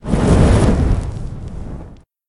death fire; smite; Ares

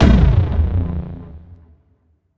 Fire; smite; raijin

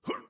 grunts; smite; voicepack; hercules

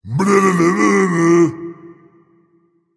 tongue, voice; Smite; ymir skin6

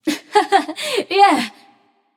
"yay"; smite; voicepack; nuwa

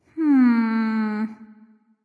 thinking; smite

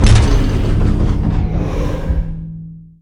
projectile; smite; chronos

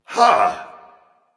surprised; smite; hou yi

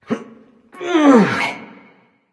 nv grunt lift; cernunnos

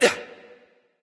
grunt attack bow; smite; voicepack; ullr

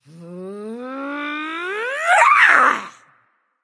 battlecry; smite; arachne

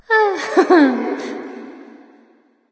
heh heh light; smite; voicepack; hel

